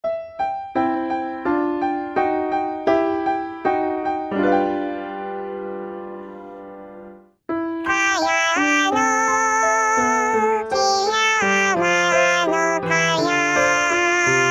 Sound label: female alt